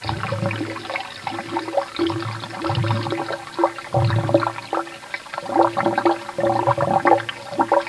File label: toilet loop; ze complex13